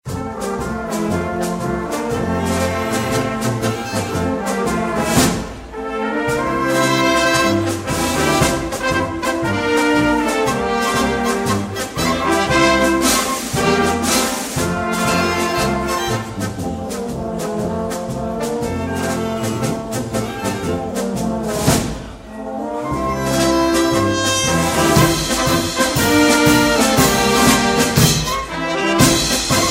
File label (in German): Polka